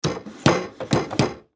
Foosball Table; Foosball Table Players Triple Move Out In Multiple; Sports

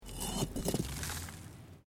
Bricks Movement Minor; Material components for constructing more complex sounds

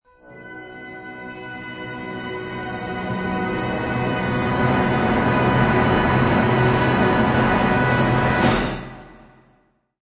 Orch Finale; Orchestra Finale crescendo cadence

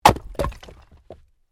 Wood Chopping Axe

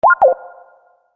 Chime Musical, Chime Musical Multimedia

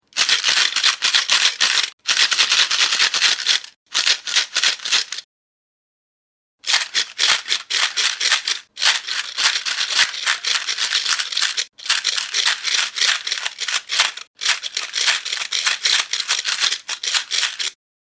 Shaking a wooden charity box with money inside; Jangling; Objects

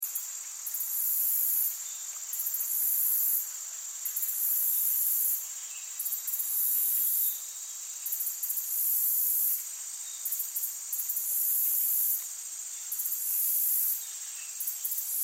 Forest Active Heavy Insects Birds Loop; Nature; Ambience

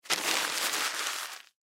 Paper Towel Crumple Sheet; Materials; Foley; Blastwave

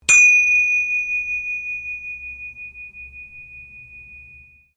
cymbals hand Tibetan, Small tibetan hand cymbals; Musical

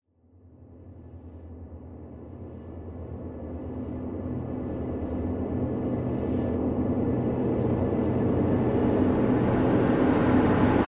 Horror Crescendo Reverse Airy Swirls; Crescendos; Blastwave; Sound Effects:Horror

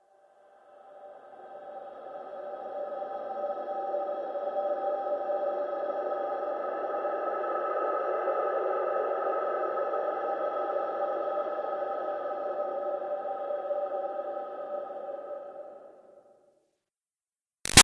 Swell Evaporating Lake Eerie; Sound Design; Big Room Sound

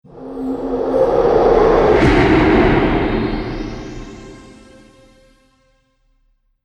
Trailer Hit Monster; Trailer Sound Effects; sound design

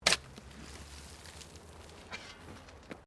Digging Shovel Sand

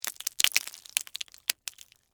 Texture, Debris, Waffle, Cones; Materials

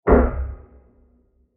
Short Metal Impact; Miscellaneous Impact; Impacts; Crafted Signals

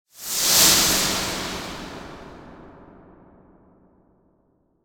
Whoosh Low Swirl Reverb; Blastwave

